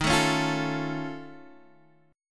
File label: F Augmented 7th D sharp, chord